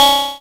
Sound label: SFX, Roland JX-305